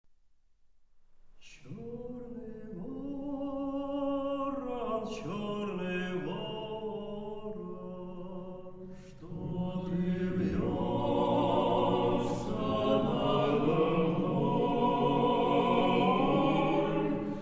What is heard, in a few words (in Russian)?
Хор